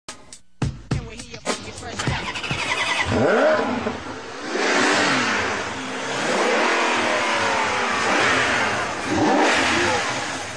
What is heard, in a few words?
Quattroporte, maserati